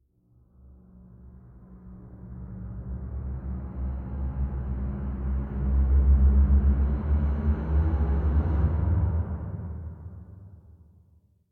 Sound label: SFX Car passby; Arrival; The Detail ios linux macos windows gamerip 2014